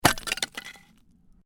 Ice chunk heavy, Ice: large chunk of ice lands on hard surface; Snow